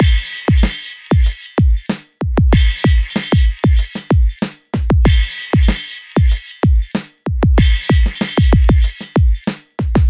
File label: Movie Beat 95